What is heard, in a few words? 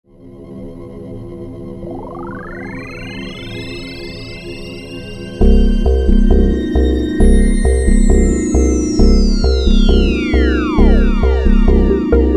cheezy-fi, synclavier; root sounds